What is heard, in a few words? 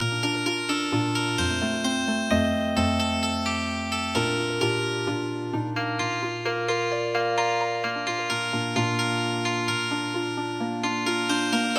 Healing Harp